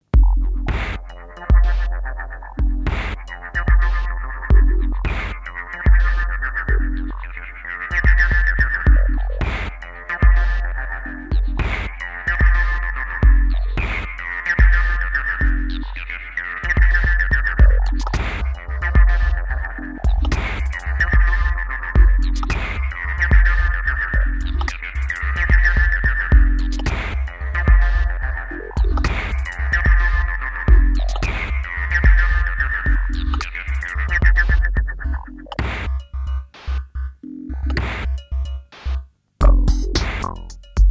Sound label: electro waves